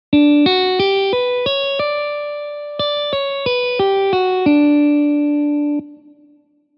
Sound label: MURCHANA Kaushik Dhwani